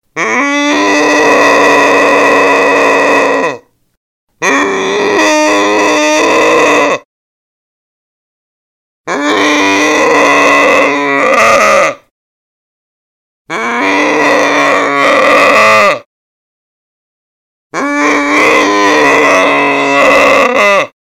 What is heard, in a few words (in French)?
ours curiosite